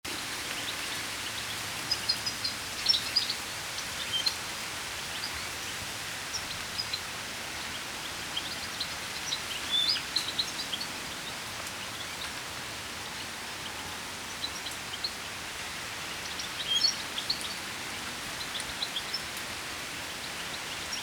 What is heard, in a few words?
Carduelis carduelis; SETE